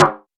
boomy steel snare drum f key, snare one shot tuned to the F note; snare-drum-samples